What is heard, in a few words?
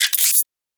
Additional Weapon Sounds